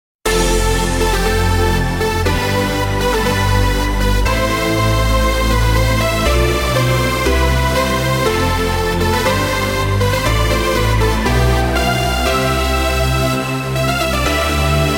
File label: rise electric